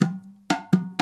bochkovoy gul zemnoy; baraban palochki

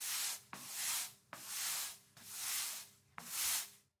schtka skolzit po poverhnosti

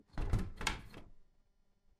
fortochku otkryili svejiy vozduh

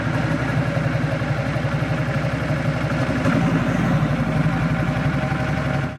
tankovaya rota; dokumentalnaya zapis

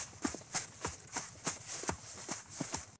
po trave myagkiy beg; deti

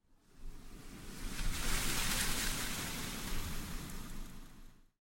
holodnoe dunovenie sentyabrya

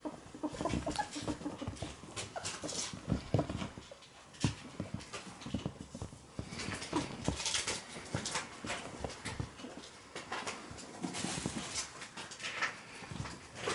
dukaet na hozyaina, hork; nensi

